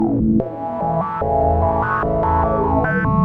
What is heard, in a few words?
A pulse